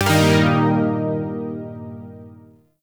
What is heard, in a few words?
Shawzin Blues Chord H; Music; Warframe; Zariman Shawzin